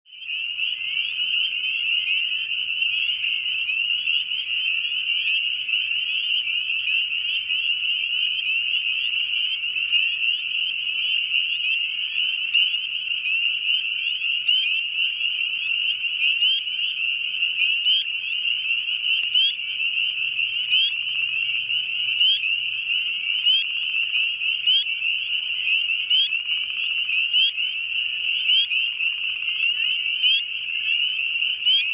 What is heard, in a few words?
Spring Peeper Calling